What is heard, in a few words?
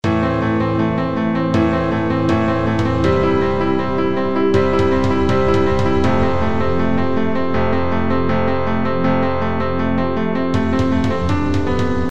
Awesome Movie Piano